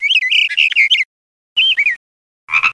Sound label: frogs